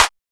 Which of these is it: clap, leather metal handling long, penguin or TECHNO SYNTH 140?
clap